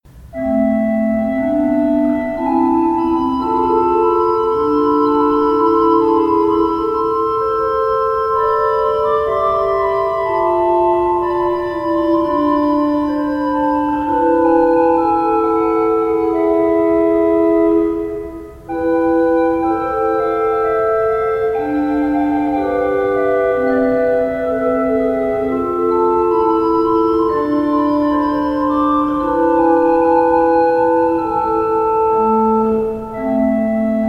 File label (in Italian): Flauto